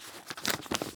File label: Package Open; this is the zodiac speaking 2020 gamerip switch ps4 xbox one pc macos